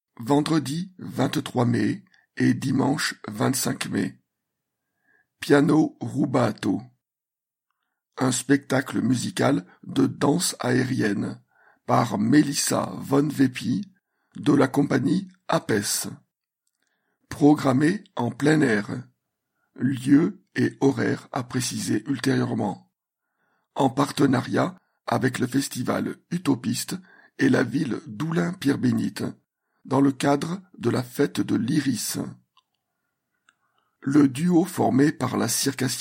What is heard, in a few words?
Piano Rubato